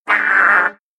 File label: Disgusted; jibo-cli; behavior-template